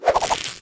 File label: vine grab; jumanji